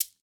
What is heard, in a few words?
Shaker A Hit; Hip Hop; Hip Hop Exotica; Apple Loops; Big Fish Audio